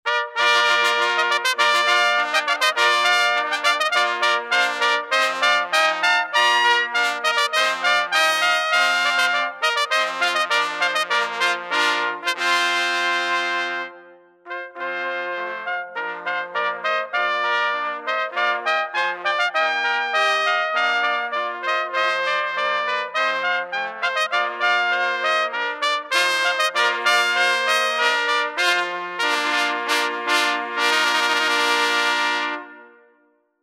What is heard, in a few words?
Herald Trumpet